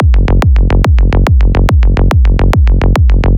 Kick Bass Bassline Triole Triple Loop G 1 142bpm Psytrance